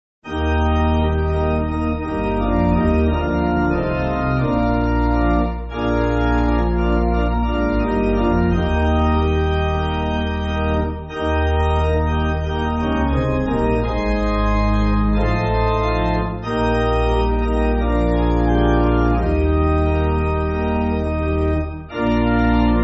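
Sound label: Pipe Organ, Backing Files for Churches; All For Jesus; E Sanger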